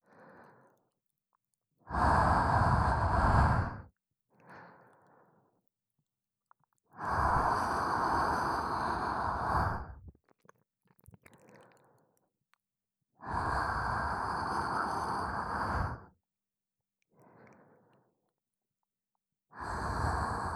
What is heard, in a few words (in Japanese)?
吐息多め; おまけBGV